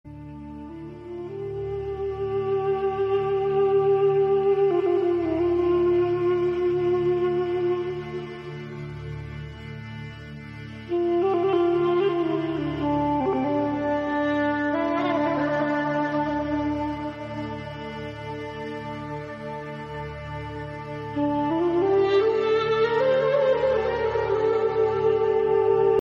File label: flute